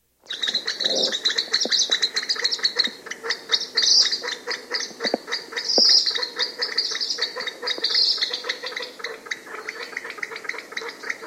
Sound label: Kalij Pheasant